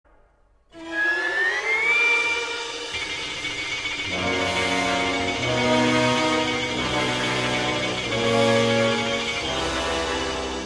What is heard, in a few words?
brass; turangalila